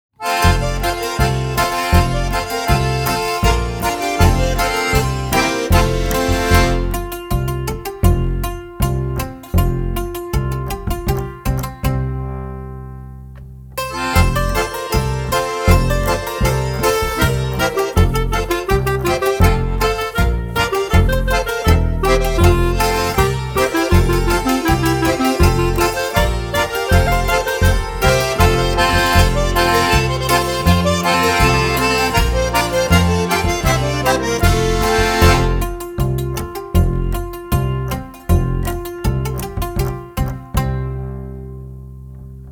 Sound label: Instrumental